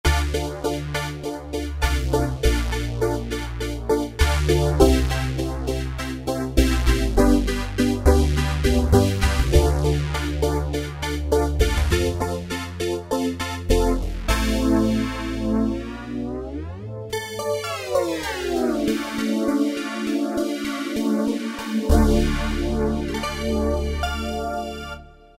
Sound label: UNIVOX PIANO; Kurzweil K2000 Best of Analog